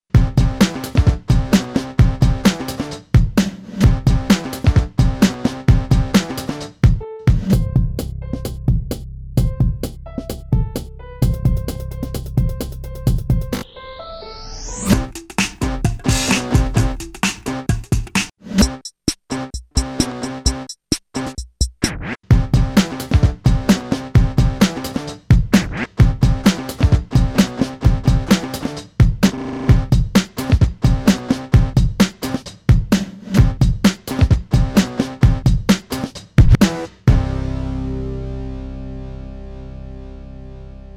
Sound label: fun synth